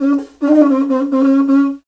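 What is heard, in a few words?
Afro Horn Phrase; Roland LCDP11 Africa VOL-1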